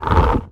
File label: Menu Horror